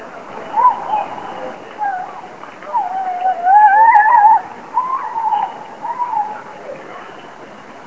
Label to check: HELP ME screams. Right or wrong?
Right